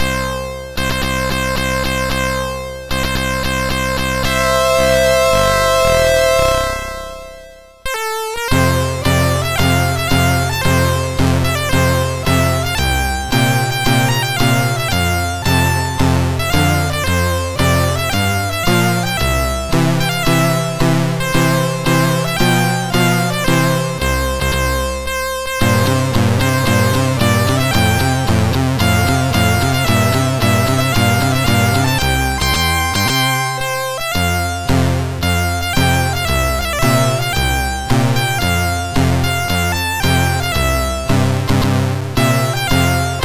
Belgian National Anthem, sid MOS6581R3

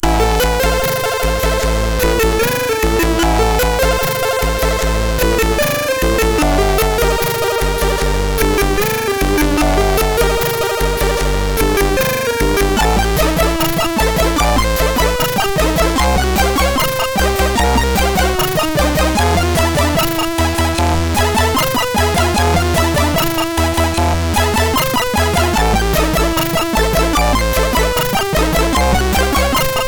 sid, MOS6581R3

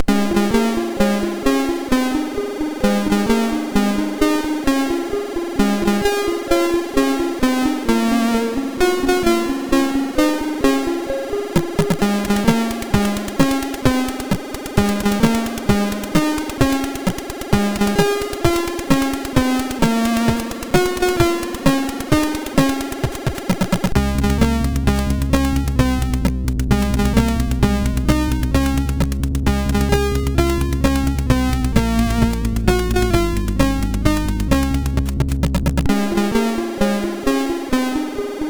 Happy Birthday sid MOS6581R4; Happy Birthday Zirco

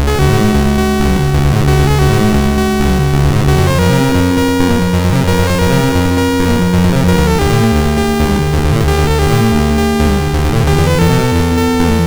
Randomly generated music BASIC, sid MOS6581R2

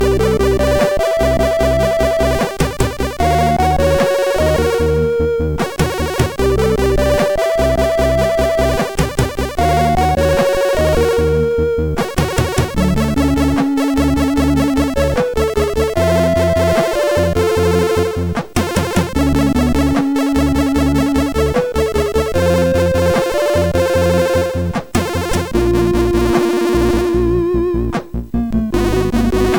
sid, MOS6581; Old Hiscore

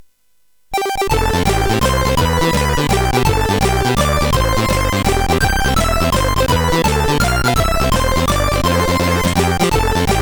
Happy Birthday, CSG8580R5; hvsc

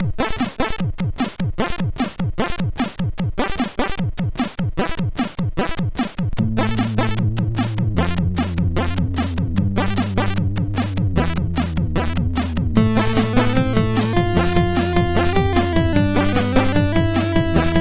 Lame Over tune, MOS6581R4

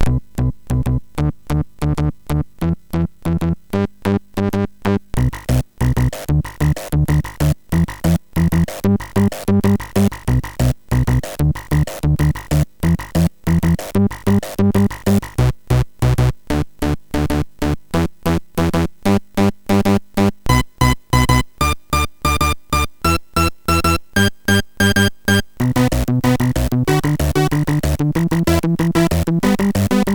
Space Bass, MOS6581R4; sid; hvsc

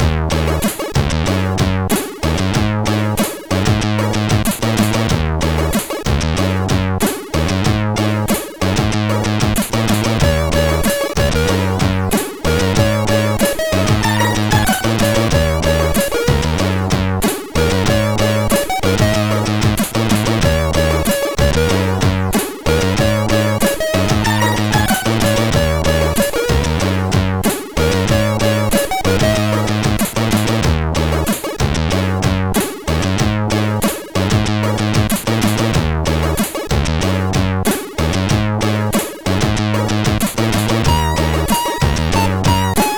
sid CSG8580R5; Noerreaalum

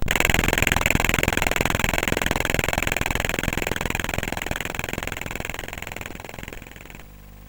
BASIC, CSG8580R5

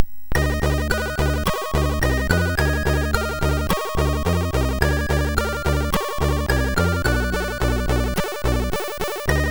Soundkit, sid MOS6581R3

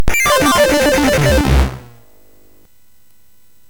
sfx, MOS 6581; hvsc

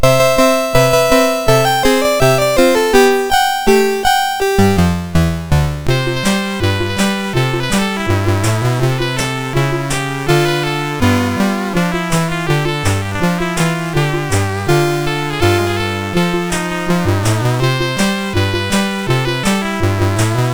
MOS6581R2; Twisted Christmas

